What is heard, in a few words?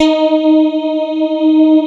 STRIA, Twisted Textures; STRYNGI